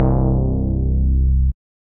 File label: Power Bass Hit, Synth Bass; Ableton; Factory Packs; Singularities